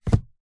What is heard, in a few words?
household book large thick set down on top of another; zapsplat